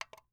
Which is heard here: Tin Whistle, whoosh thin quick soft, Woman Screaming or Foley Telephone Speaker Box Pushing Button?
Foley Telephone Speaker Box Pushing Button